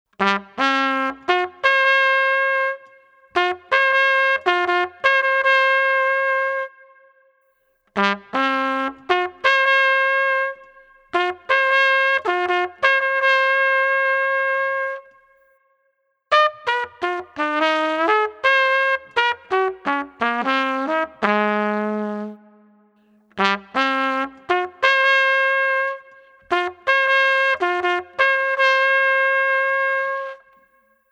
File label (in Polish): Hejnał; Hejnał Rzeszowa